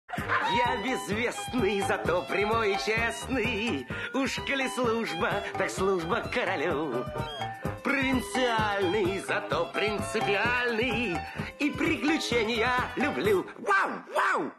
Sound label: dog song